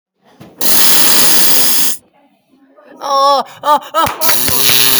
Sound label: fart